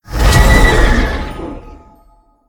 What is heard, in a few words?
projectile; Smite; god Lancelot